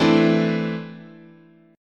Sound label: E 7th Suspended 4th Sharp 5th, chord